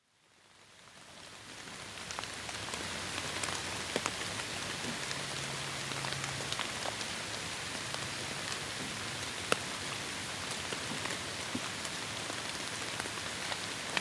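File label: with the sound of large drops on wide leaves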